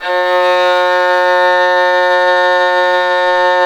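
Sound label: SOLO VIOLIN, Synclavier Strings; ILIO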